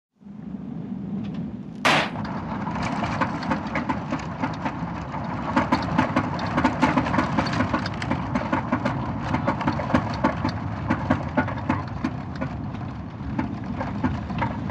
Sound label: Auto Blow Out Punctre, blowouts